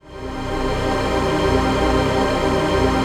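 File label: trance pad; Best Service; XX Large - Pads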